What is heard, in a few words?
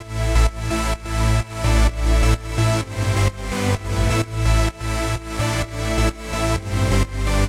128 A Synth Chord